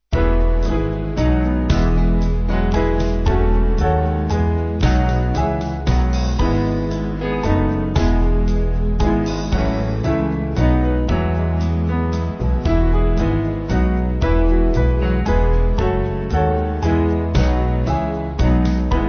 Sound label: Band; Hyfrydol